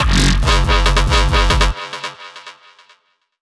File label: dubstep club bass